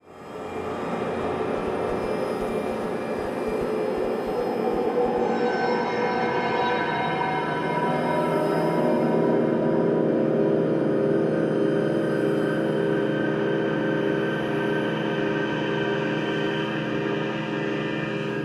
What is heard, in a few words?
Ambience Discontent; WoW; Shadowlands; Bastion